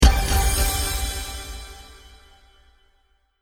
Magic Casting Spell; fiction sound effects

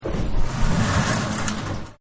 Junk Drag; Dragging; Objects; Partners In Rhyme

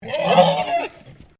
Animal Pig Landrace Screetch; Edgar; Edgar's Mission Farm Victoria; Pigs; Animals

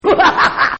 Psycho Laugh; Buttons; Multimedia

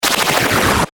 Static Burst Short, Static, Burst, Stutter; Production Element, Imaging Element; Accent, Transition; Static Sound Effects; BlastWave

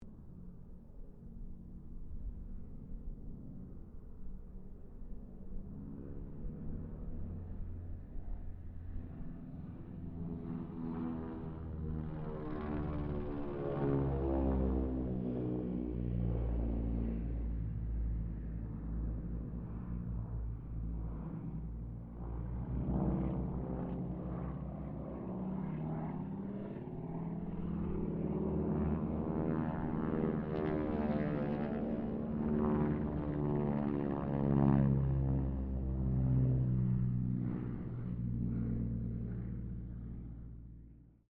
AIRCRAFT HARVARD SQUADRON 3 PASS DISTANT; Mixed aircraft squadrons; Airplanes; Vehicles

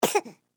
Human Baby Cough; Baby Sound Effects; Babies (Sound Effects:Voice)

